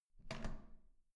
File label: door handle down